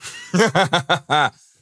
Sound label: laugh; devour